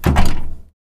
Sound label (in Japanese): doa akanai; easyrpg; Ib